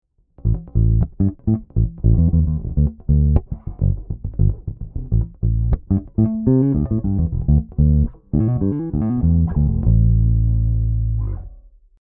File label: Markbass MB58R CMD 102 Pure Fingerstyle DI; Oldschool66